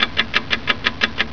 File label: tnt tick